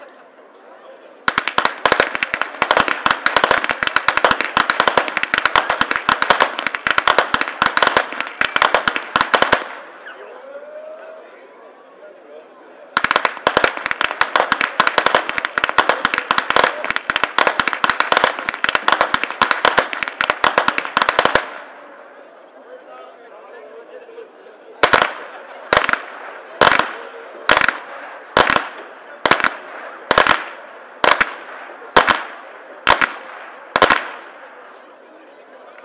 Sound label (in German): schnalzen, flachgau